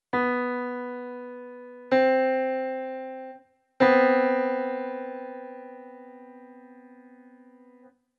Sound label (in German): intervall c h kleine Sekunde